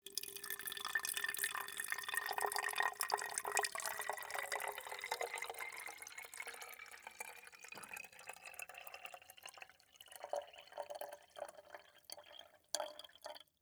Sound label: Liquid Water Pouring Into Aluminum Can